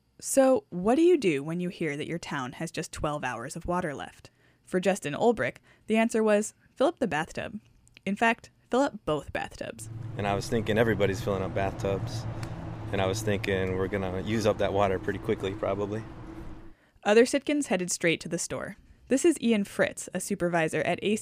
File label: Water Vox